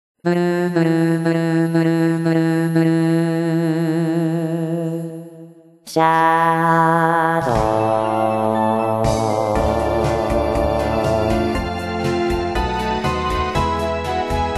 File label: chor